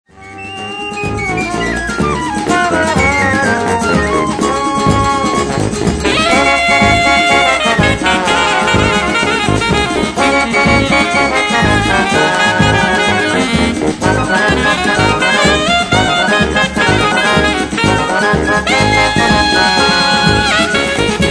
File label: samba, Selmer alto; lacquer